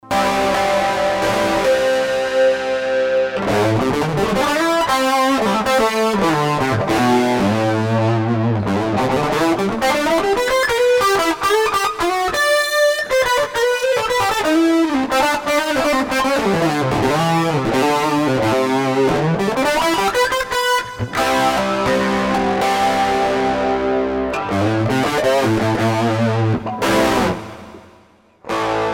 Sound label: HD DSP with Dist 2